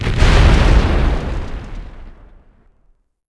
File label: Bomb Four Explosion; Civ4 warlords